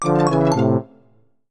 game Over

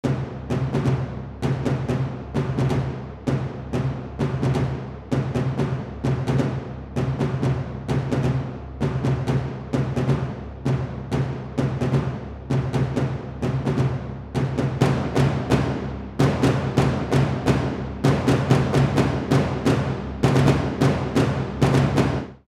Drums; Bear Arena; Drums of Liberation

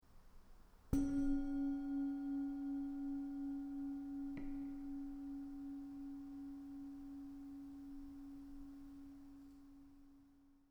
old bowl

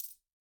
player Coin

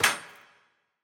Shrapnel Hit

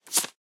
wood tile pickup

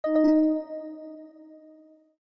error

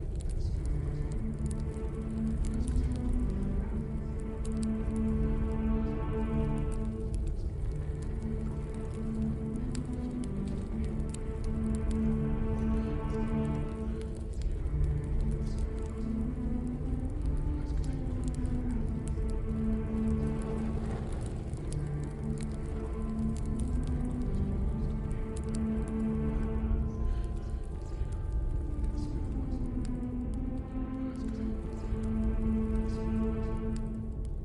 ambient sounds, cleric; Heroes of Holdem

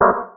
error

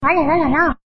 alalalala; korone; vtuber

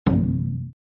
keno disabled num

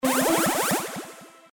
fish entered; fish3; ThreeHeadedDragon

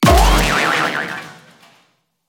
Tank Explosion

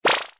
Teleport; basketball-stars

